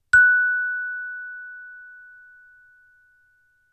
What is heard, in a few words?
Chimebar F